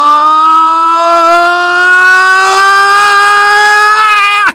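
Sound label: engineer pain critical death; voice packs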